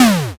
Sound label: explosion s